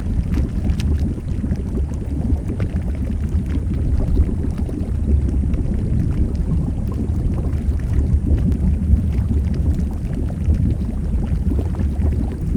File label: acidic idle, anomalies; Arrival